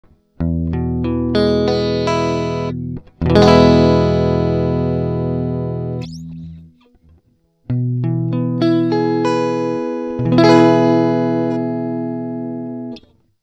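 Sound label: old guitar strings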